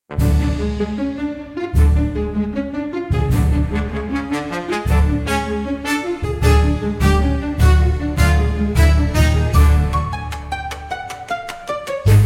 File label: Tuba Tumble Chase, comical orchestra for slapstick gag scene